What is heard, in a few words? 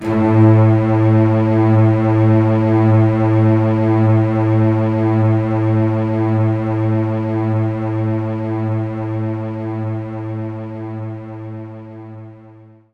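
String Loop A1; knights try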